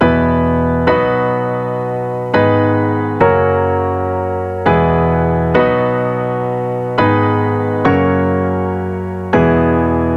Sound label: Pop Chords Poppin BMaj (G#Min) 103 BPM; ultimate vibes midi pack 2